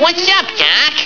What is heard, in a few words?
BUGS